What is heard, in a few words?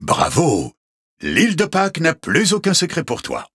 Rap